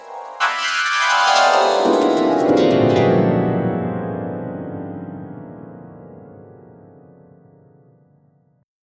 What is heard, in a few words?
delicate harp like gl; motion blur